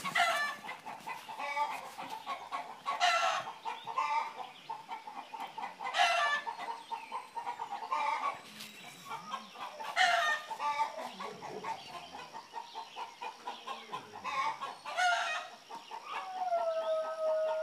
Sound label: Dogs And Chickens Singing